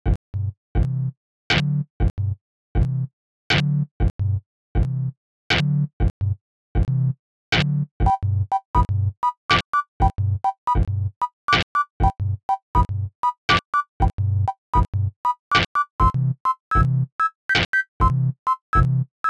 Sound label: Sine Wave Project